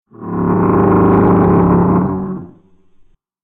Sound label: Stegosaurus Roar; dinosaurs